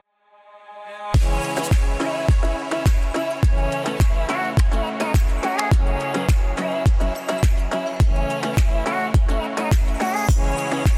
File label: SAX